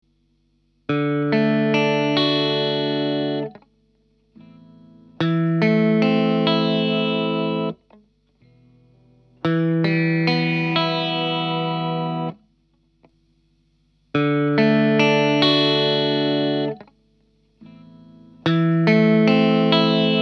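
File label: SSL 2 Mic Gibson und 4K, guitar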